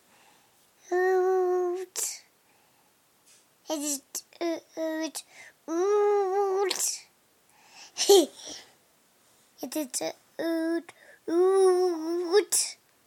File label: oud